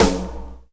subtle reverb snare drum sample b key, snare sound tuned to the B note